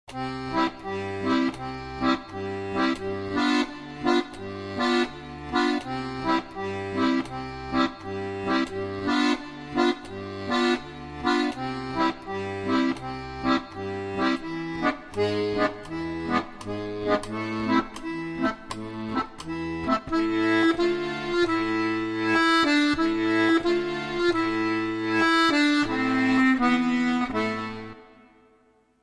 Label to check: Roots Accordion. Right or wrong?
Right